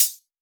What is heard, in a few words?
Closed Hihat; Cymbals; Mutekki Media; Vengeance Electroshock Vol.2